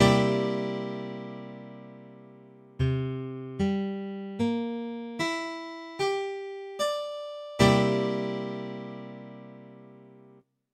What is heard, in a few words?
C9, Guitar Chords